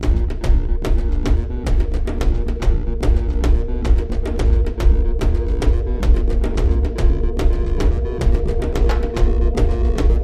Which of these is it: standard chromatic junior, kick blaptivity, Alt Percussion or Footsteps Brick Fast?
Alt Percussion